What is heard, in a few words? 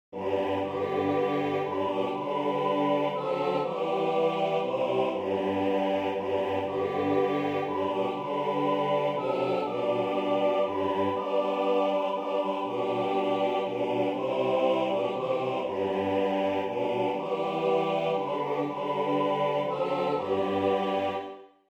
soprano; Amazing Grace